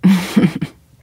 heheh; ningguang

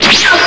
teleport

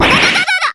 shock; bb mai natsume

